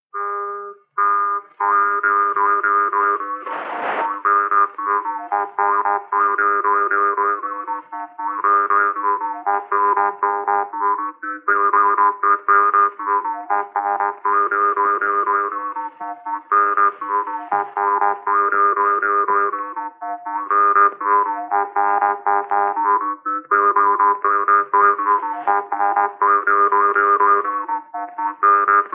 Kuuse polka parmupill; Kirbla; folk

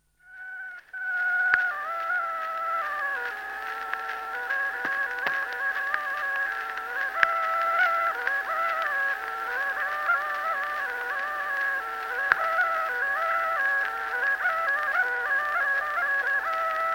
Kuusalu torupill folk; Saajalugu; Jakob ratsov

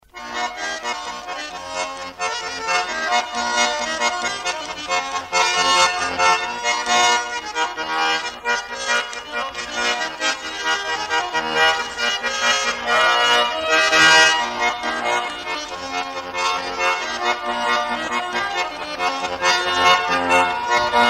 Loosu küla polka lõõts; Polva; folk

